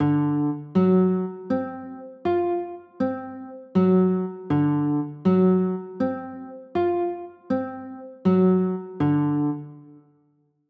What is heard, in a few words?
d 7 sharp 9, chords